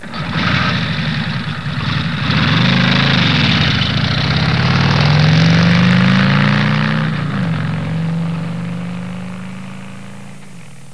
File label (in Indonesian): suara mobil